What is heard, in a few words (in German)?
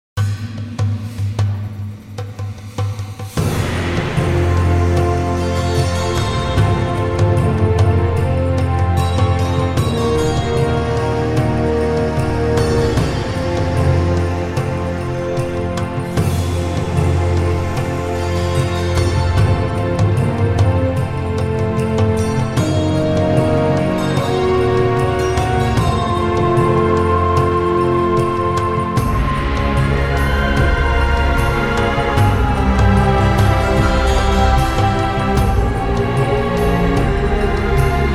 Music Arabisch; rise of venice windows gamerip 2013